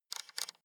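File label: sports car interior volume button spin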